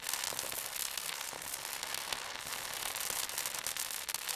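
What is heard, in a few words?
Weapon arc weld idle loop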